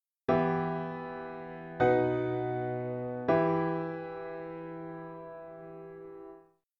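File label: Piano, Dictation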